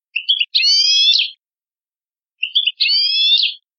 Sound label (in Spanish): quejas comp